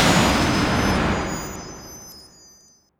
mana leak cast; weapons; dota; keeper

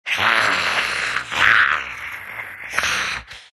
snarl; Undying

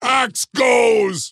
move pain; dota; axe

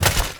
metal armour pickup, inventory; ui

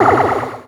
orb absorb; weapons; dota; medusa

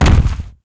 walk, footsteps; earthshaker

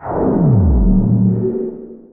dissimilate cast; void spirit

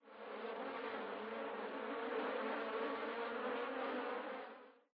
bees, summer; ambient soundscapes; dota

